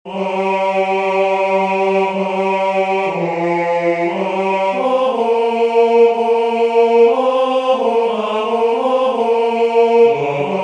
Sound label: tenor